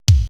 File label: drum d#3, drum computer, 80s drum set; Best Service ProSamples vol.55; Retro Sampler